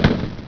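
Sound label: shotgun; Pro Android Games; Wolf3D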